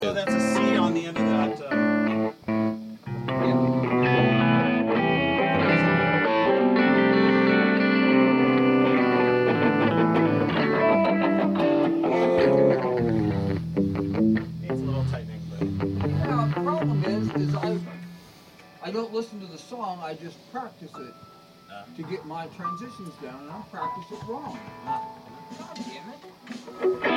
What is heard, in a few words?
Background Noise Mix